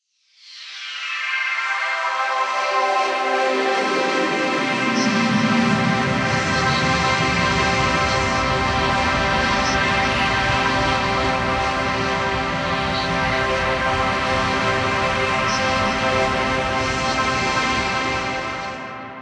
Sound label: pad sweep highpass; flaechen